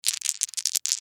Dice Shake

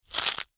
Crunch Floral Foam

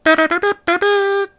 Charge Chant

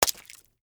Crunch Crack Egg

